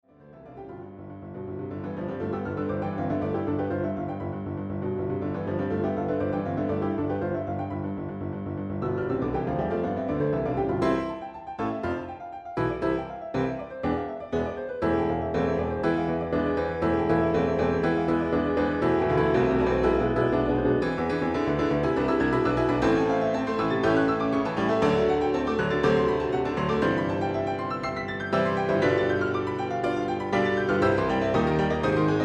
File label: 4 Hand Piano; Mvmt 1